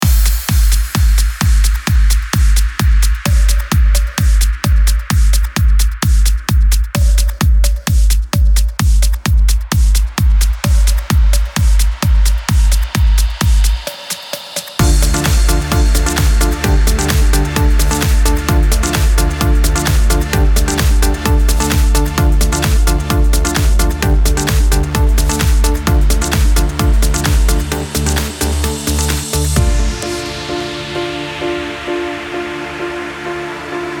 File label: Audio Mix - 130 BPM D Minor, Progressive Trance; Martech Progressive Trance Construction Kits Vol 2